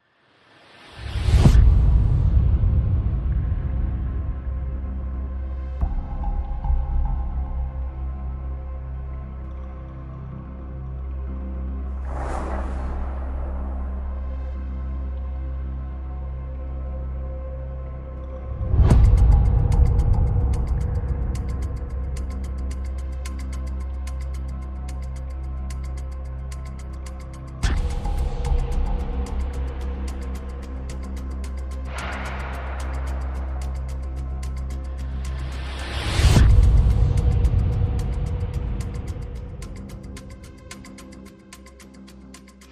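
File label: Percussion Pads and Pulses Only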